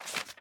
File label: Footstep Paper-Sheets